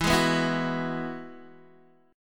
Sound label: E Major Add 9th E, Guitar, DADGAD